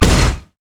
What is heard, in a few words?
steam golem basic attack; LoL; blitzcrank